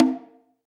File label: CON, ST CONGA; AKAI S-Series CD-ROM Sound Library VOL-5